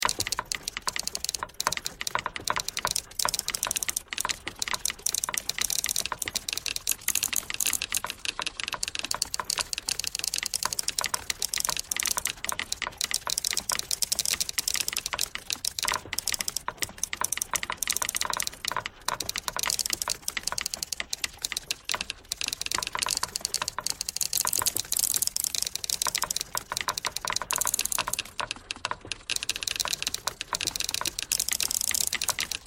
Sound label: typewriter thread pentatonic all